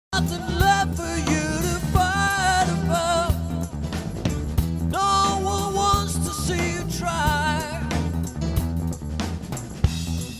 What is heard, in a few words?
shuffle